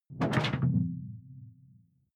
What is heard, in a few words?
sci-fi alien bloom; swooshes-swishes